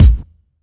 big kick sample a sharp key, Royality free kickdrum sample tuned to the A# note.